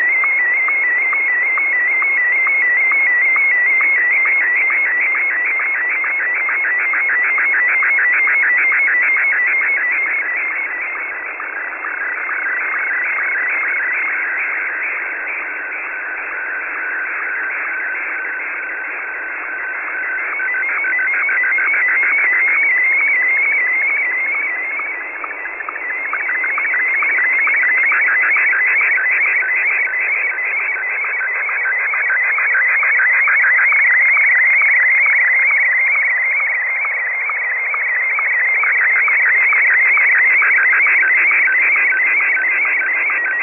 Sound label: sstv martin1